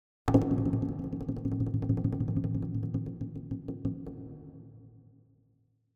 Drums Percussion Danger - Fast Drumming On A Medium Percussion; Hollywood Edge; sound designer's toolkit v2